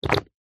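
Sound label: Swallow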